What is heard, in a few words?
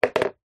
foley plastic tub small down on hard ground; zapsplat